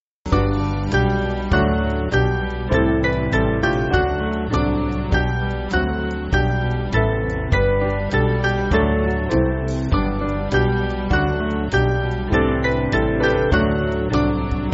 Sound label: Band, Backing Files for Churches; Saviour Quicken; Warzburg